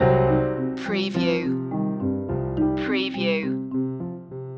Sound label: misty funk loop